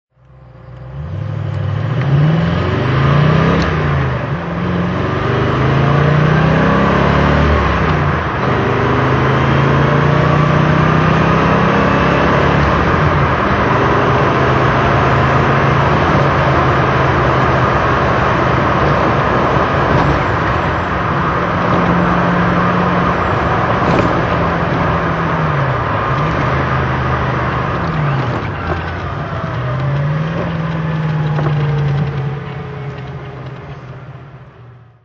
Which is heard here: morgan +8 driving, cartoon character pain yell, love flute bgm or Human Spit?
morgan +8 driving